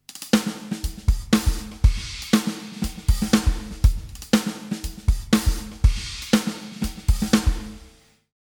studio drums hall

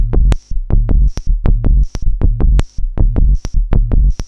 Liquid Foam Loop; HerbsAndStones

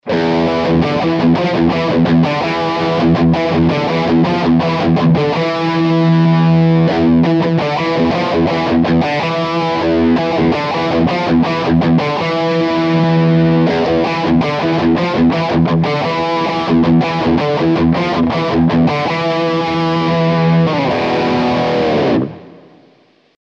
PlexiRanger Plexi plus Boost Low Cut; Carl Martin

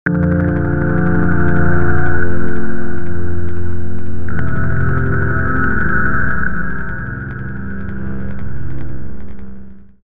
Pads

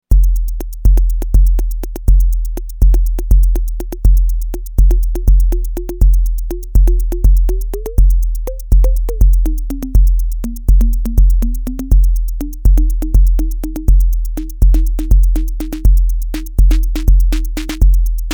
Volca Snare

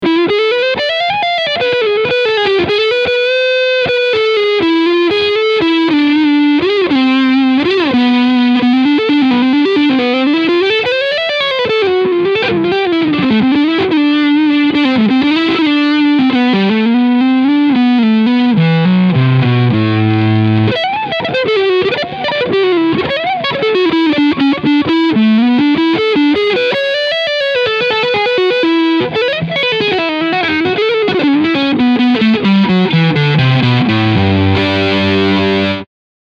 Harley Benton ST 20HSS Leadsound Front PU

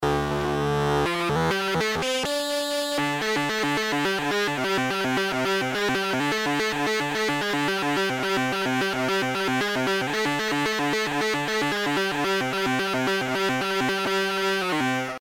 MS20; FreqBox

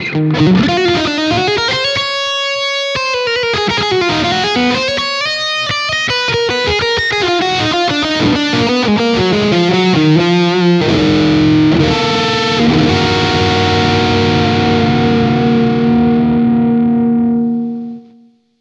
Fusion Lead line

